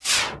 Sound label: minimize; windows 98; Inside your Computer